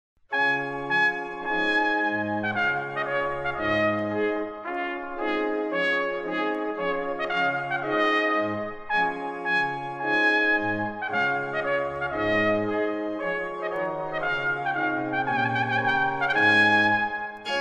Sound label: Trumpet Tune; Clarke